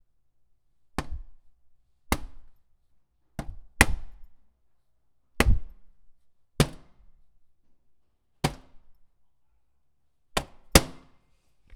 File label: Slamming Microwave; West Hall Tech Dump